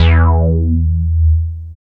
BASS, Tekno Industrial; East West

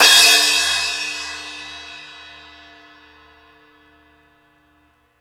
Hont Crash, Cymbal; KJ Sawka; Drum n Bass & Beyond; Drum Tool Box

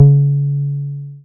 Bass SH-101

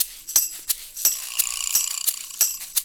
Roots Reggae; Sounds Good - Roots Reggae

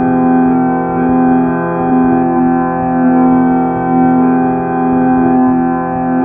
Harmonium Sequence; Sonic Foundry (Sony Creative Software); Loop Noir; Session 12 - Otherworld Journey

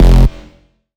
Bass F, Bass Synth

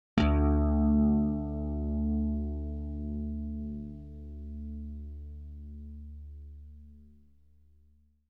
bass slap d2; Sound Sampling Collection VOL-5; Drums & Bass Fusion Funk Effect

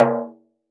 Dof Tak, Roland BK-5 Percussion

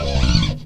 BS CHPSTK, Chapman Stick; Roland L-CD701

